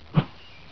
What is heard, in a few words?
woosh; the hat chooses the wizard